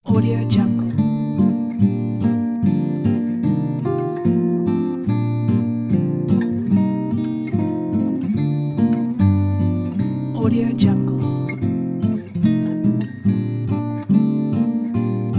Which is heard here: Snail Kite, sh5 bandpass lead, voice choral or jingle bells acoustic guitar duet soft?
jingle bells acoustic guitar duet soft